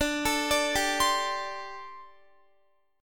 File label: D 7th Suspended 4th, down, Guitar; D7sus4; arpeggiated